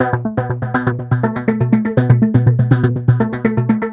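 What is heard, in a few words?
122 BPM Sequence A major F minor Tonic B; innovative sequences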